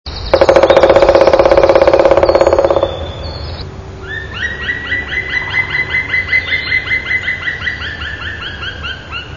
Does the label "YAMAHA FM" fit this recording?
No